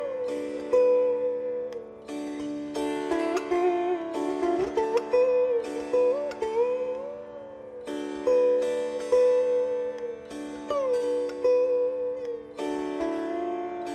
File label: Shivranjani, hindustani raag